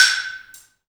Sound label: balambo, balafon; Heart of Africa